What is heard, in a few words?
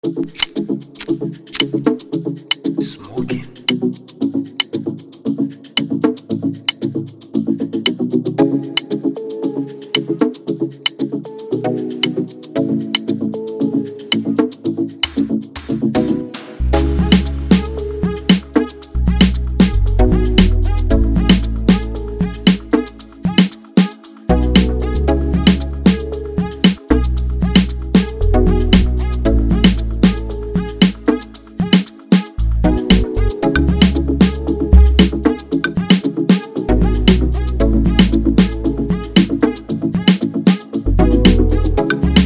FREEBEAT AFRO